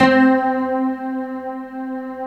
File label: PULS HARP C3; Big Fish Audio; Synth City